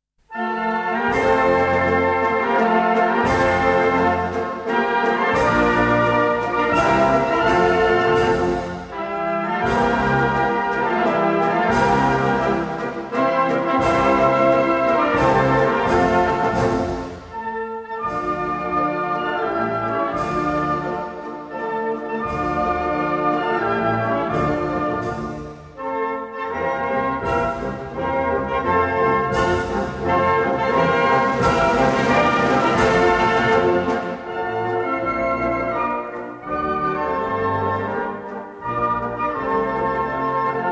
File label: cyprus national anthem